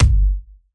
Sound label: kick